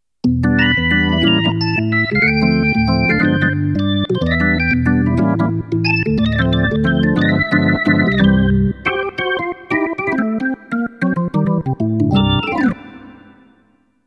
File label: yamaha ex